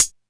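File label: hat closed, Alesis DM5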